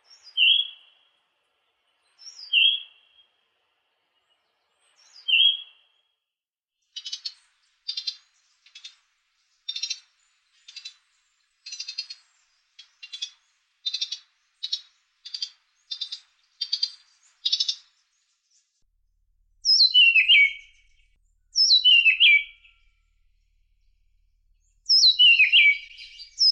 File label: Gray breasted Woodwren